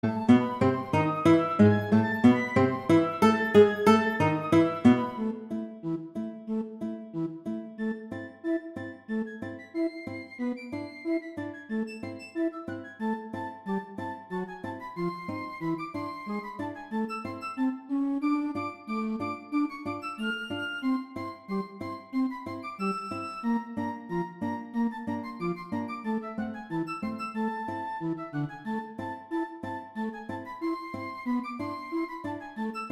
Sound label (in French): guitare et flute